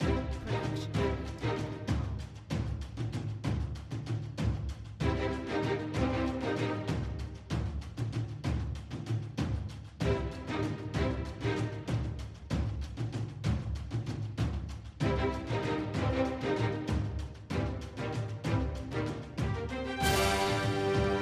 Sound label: optimistic turnout bpm 96; soundscrate